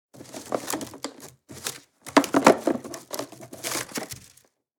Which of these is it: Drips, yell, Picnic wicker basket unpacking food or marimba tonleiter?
Picnic wicker basket unpacking food